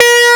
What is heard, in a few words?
AN SYN, SYN & LEAD; SAMPLE WAVE MIXING 2